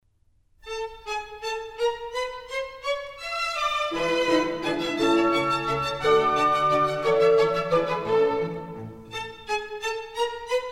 German Dances, A major; Mozart; Dances and Marches

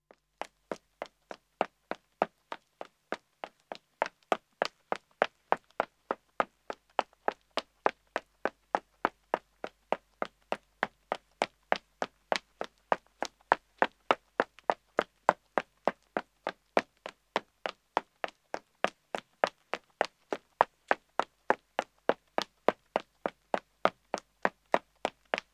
Pavement Running man starts &, Footsteps; The BBC Sound Effects Library

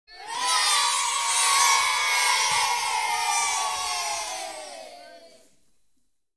Babies And Children - Children Cheering; Rec. At St. Luke's Primary, Brighton

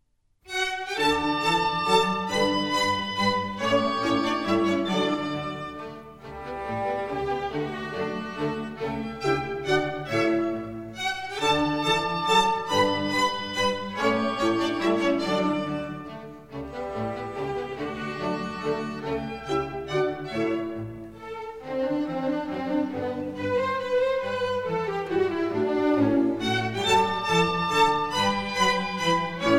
Minuets, D Major; Mozart; Dances and Marches

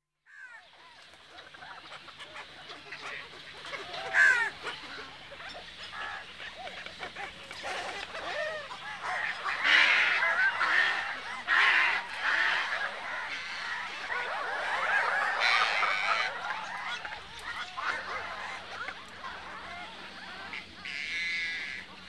Water Birds; Asian Darter And Spo; Sound Effects Library

